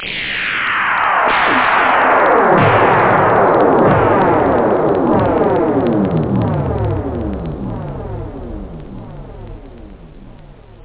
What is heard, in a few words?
stellar, whoosh; da capo vol1